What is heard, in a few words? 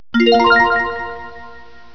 correct; Etch A Sketch Quick Tour